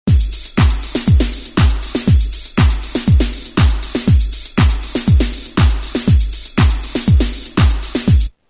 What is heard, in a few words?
909 Dsco 2 120; Roland M-DC1